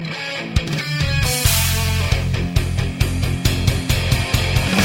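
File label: Guitar Message tone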